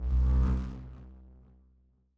meleeattack impacts magical effects necrotic; psfx

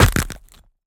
creature crab organic texture attack impact; sonniss gdc

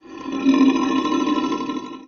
growl, monsters; Creatures

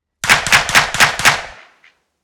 uzi 9mm 75m behind clean five shots

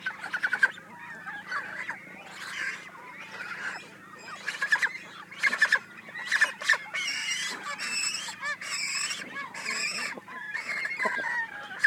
animals cormorants nestlings short sounds; stereo; sonniss gdc

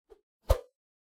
melee attack swoosh light; psfx; weapon swooshes

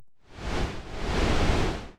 ranged magic energy strands; 90ft; psfx

